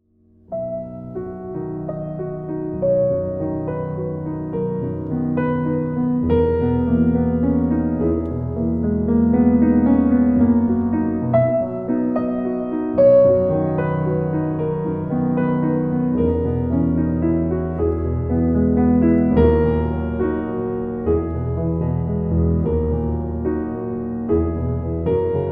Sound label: Piano Sonata